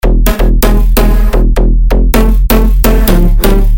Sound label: Slap; sickrate siik essentials iv